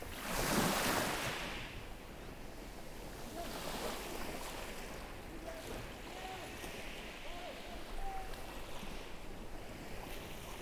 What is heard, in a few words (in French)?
anse du magaud plage gravier; BK bonnette 15db